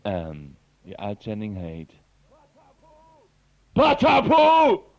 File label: jingle